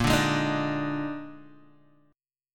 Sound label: A sharp 11th, Guitar DADGAD